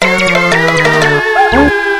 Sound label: failure; tf; microtf2; twario